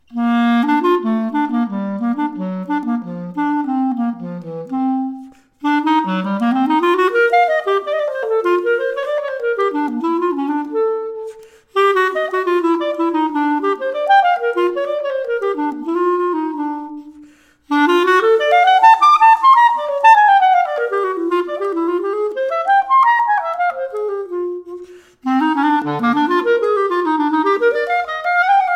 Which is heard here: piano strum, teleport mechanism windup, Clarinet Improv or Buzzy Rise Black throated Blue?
Clarinet Improv